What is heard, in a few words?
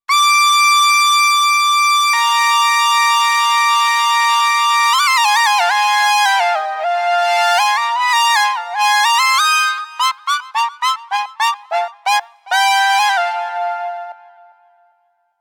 Akai EWI4000s Intervall